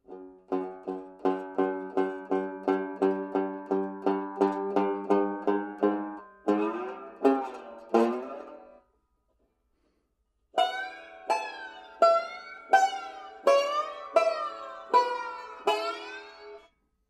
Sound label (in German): bottelneck, banjo